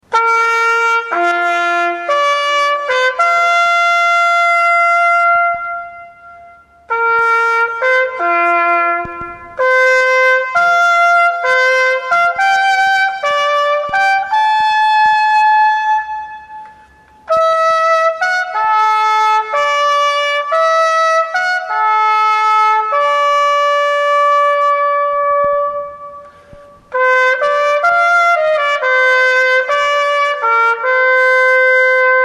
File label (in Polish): hejnal pelplina